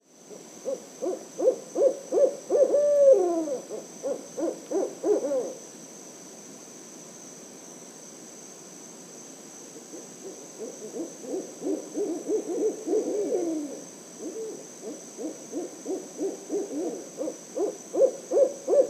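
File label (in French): chouette rayée chant series; myakka city fl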